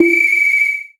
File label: Boss Hit